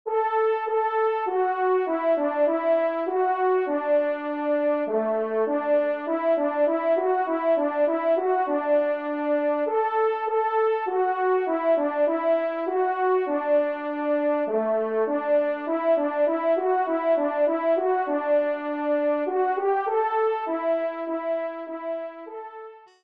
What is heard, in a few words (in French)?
Cotillon Do Maj TRP; 2e Cotillon; Corrette